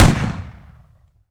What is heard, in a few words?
Shotgun Boosted; Shotguns Boosted Intense; Doom